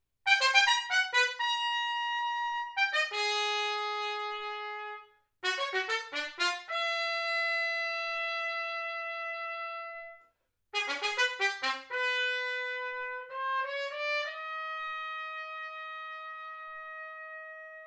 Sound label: All brass C